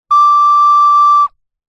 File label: musical instrument recorder 1x toot med pitched